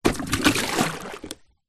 industrial bucket plastic place in deep water and fill; zapsplat